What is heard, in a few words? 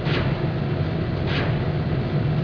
metal; Thief